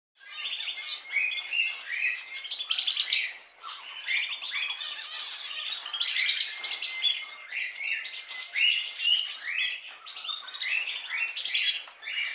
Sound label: Birds chirping and whistling